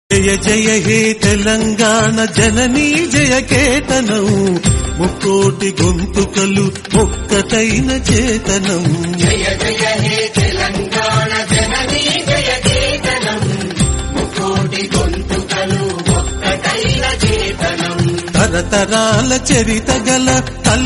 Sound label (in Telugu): Telangana Telugu Song Ringtone